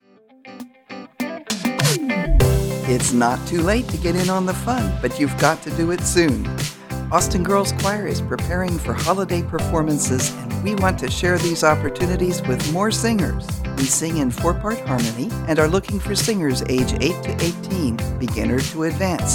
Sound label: Girls Choir; Austin